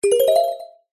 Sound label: game sound magical mysterious glissando